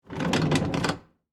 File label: Industry, Cart, Roll, By, Wood, Surface; Rolling